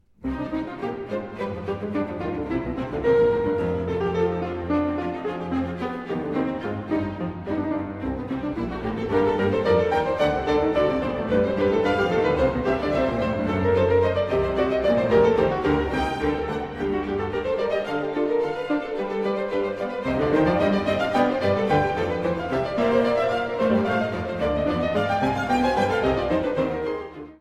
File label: Concerto In C Minor For 2 Keyboards BWV 1062 1. Allegro; Bach